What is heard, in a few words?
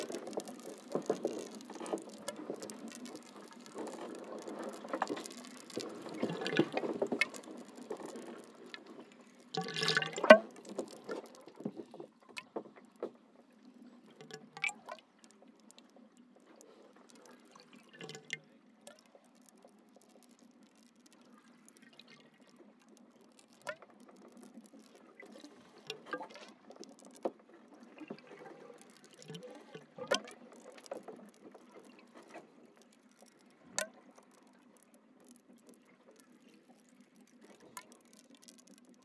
Sailing